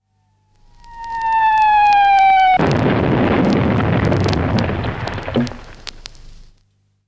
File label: A shell whining and exploding (from 78 disc); BBC